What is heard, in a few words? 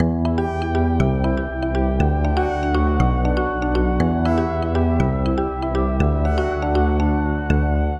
loop alt alchemy w bass lead orig